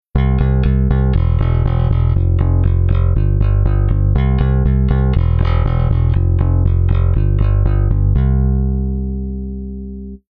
bass pickup front

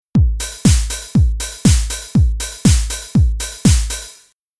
Rhythm

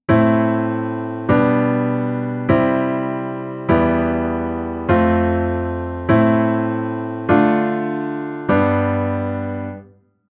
Chord Reharmo

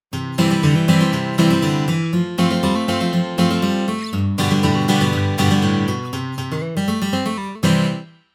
guitar

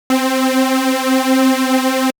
Synth Subtractive

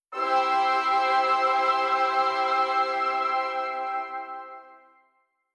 WW Close

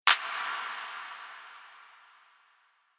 Future Bass Rhythm Clap Reverb

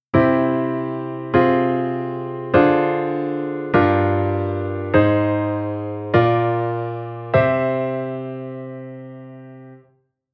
Chord Modal

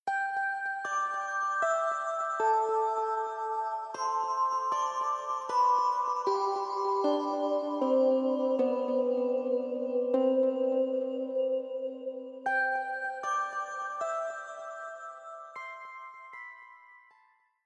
Reverb Delay Celesta Layer; Mix Insert

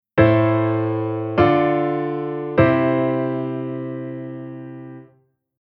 Chord Cadence

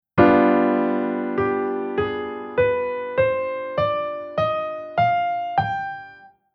Chord Scale Dominant

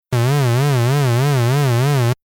synth lfo phase

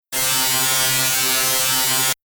Synth Filter Comb

